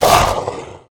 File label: Swamp Ent hit; SwampOfDarkness